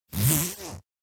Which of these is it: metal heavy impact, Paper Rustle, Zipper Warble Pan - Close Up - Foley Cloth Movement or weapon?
Zipper Warble Pan - Close Up - Foley Cloth Movement